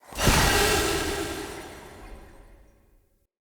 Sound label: Root Cave Magic Spore Puff Large; Ambience; Props; Neathuns Root Cave